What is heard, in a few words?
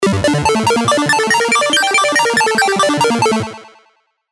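Serum, pluck; Thunder Force IV; CHIPTUNE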